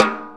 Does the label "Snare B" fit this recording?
Yes